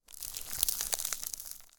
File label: ice